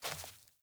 Sandals Walk Dirt, Footsteps; Characters; Player

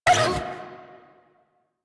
Feral Vocal Pain; Enemies; Kubrow; Warframe

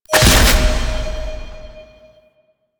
Modifier Pickup; Warframe; Nineteeen Ninety Nine; PvPvE

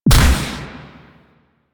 Pistol Proj Explosion; Weapons; Warframe; Drifter; Operators

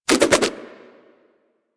Nunchuck Spin Whoosh; Warframe; Infested

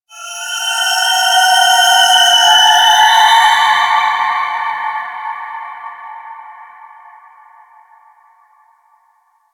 Space Exterior Dist Call, Space Battles, Ambience; Warframe

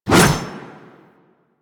Melee Whoosh; Wraith Reaper; Warframe

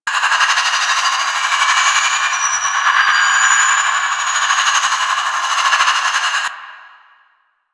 Void Ghost Loop; Warframe

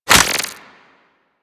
Minigun Reload

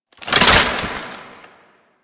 Rocket Launcher Drop; Weapons; DE; Warframe; Tenno; Lasrian

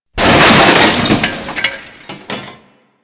Shipyards Crusher Arm Debris; Ambience; Props; Warframe; Grineer Shipyards

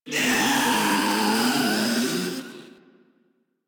Proto Infested Ancient Death, Enemies Vocals; Warframe

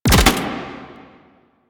Archgun Mech; Warframe; Archwing; Weapons

